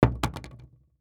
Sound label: Household Knife Butcher Small Hitting Hollow Wood